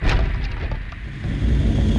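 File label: Music Volcano Light 120; from dust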